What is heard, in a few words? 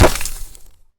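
Bullet hit ground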